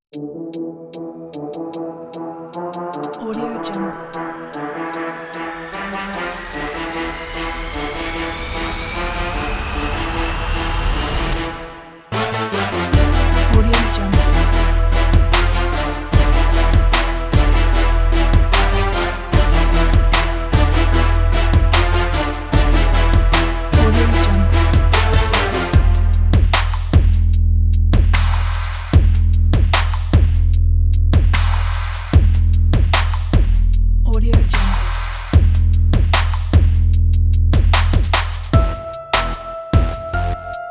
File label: big trap brass slam; dnmusic